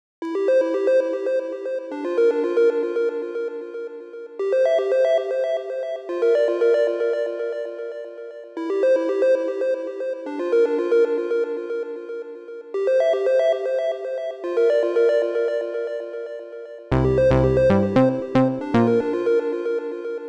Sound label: Penguin chiptune